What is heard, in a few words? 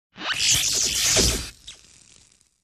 Electrical shock